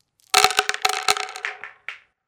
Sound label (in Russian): zvuk tabletok